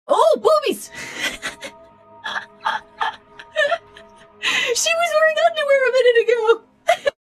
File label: jumpscare sound; fnalimealicious 2 withered